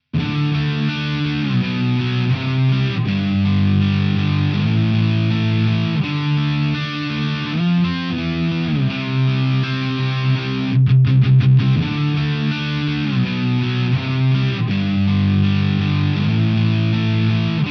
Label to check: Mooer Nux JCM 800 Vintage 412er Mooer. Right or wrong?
Right